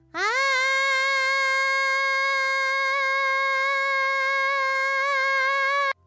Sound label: aaaaaaaaaaah